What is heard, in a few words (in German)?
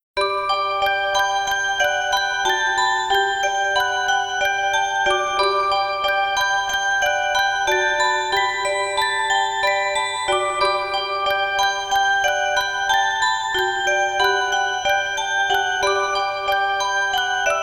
Orgel; Yuka